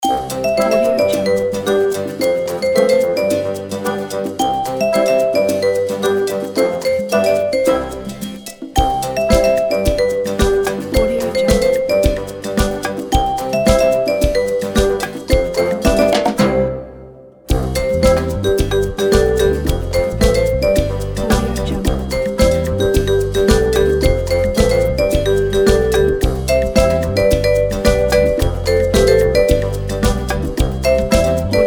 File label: cartoon character grasshopper; studioetude